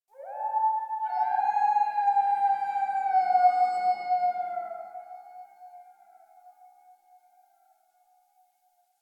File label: Exterior Distant Calls, Ambience